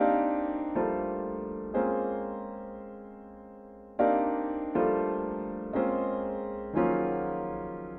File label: Minor Piano Chords